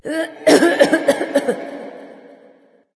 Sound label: clear throat light; smite; voicepack; hel